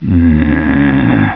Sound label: ughhh